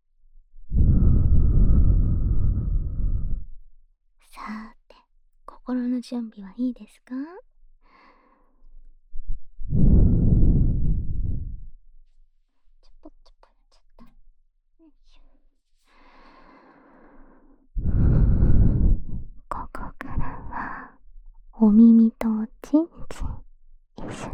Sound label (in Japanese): 耳舐め手コキマッサージ, ASMR